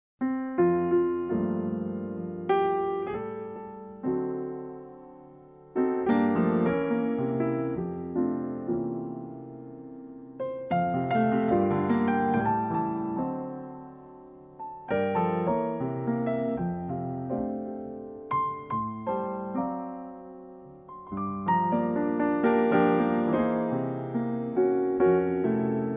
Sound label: jazz piano; Charlie Brown